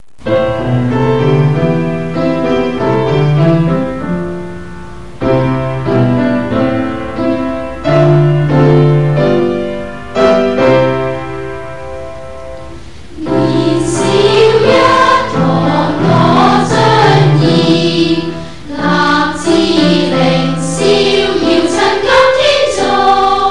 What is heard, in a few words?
sch song